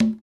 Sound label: Congas, African Contemporary